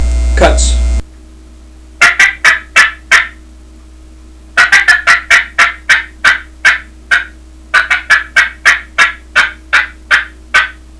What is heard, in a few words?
cutts, turkey; dixie